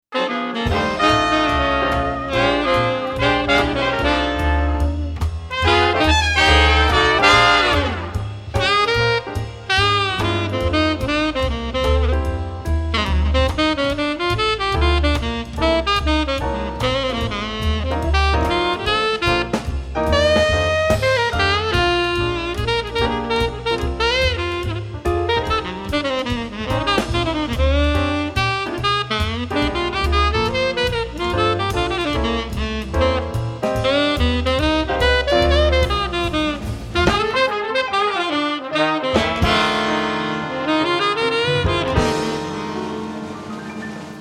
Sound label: Blues